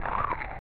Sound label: Monster Eating